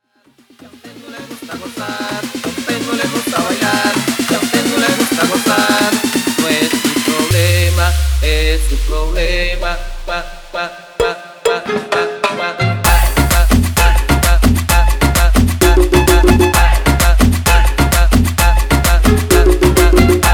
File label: guaracha remix 130 bpm